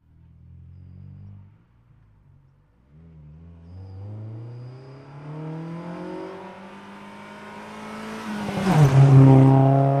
Integra, medium speed approach, medium by, decelerate away, Acura Integra